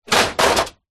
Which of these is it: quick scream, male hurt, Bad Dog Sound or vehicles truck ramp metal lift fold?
vehicles truck ramp metal lift fold